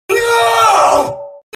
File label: isaac scream